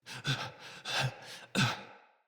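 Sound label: Cry Heavy Run, Barks; Battle; Envoy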